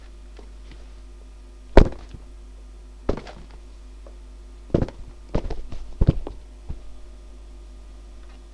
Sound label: thuds